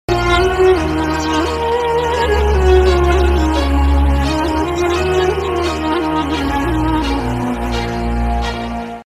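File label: Whatsapp Ringtone SMS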